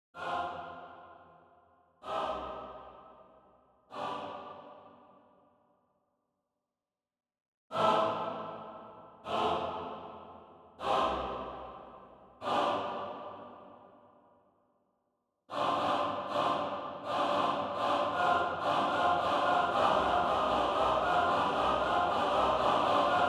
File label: Choir Micro